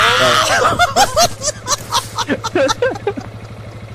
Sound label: laugh